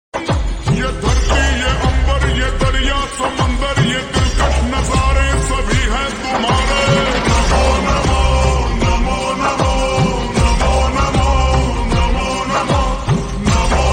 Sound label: Ringtone Slowed Reverb